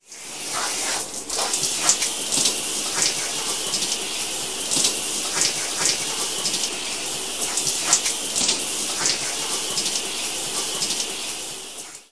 suihku

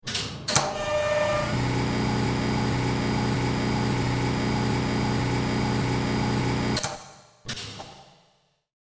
Hissi

